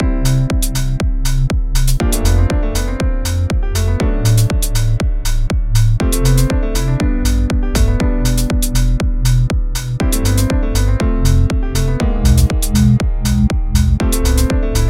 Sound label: techno